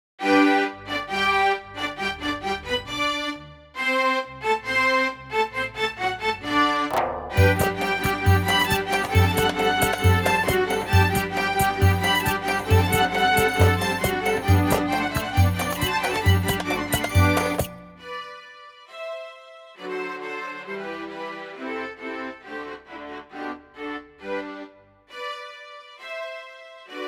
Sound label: Istanbul String, KORG PA3X